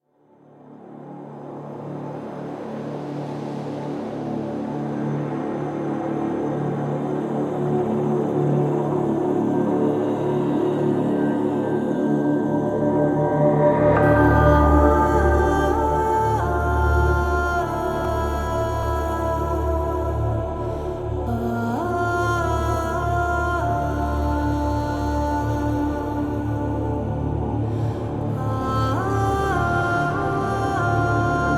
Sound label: Cradle Back Music; Operator Cradle; Warframe; Second Dream